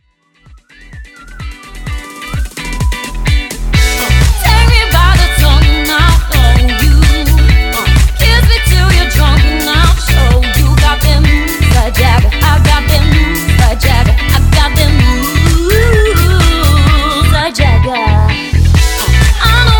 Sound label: Rock Pop Band